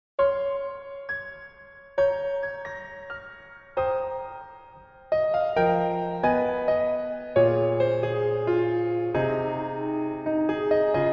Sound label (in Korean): Piano MR 멜로디 MR 여자 키